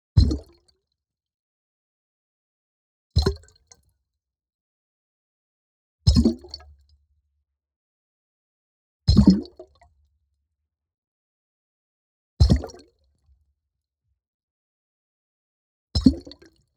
Object Large Impact Long Shallow Water Underwater Single